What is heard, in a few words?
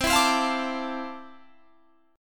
F Major 13th C, guitar chord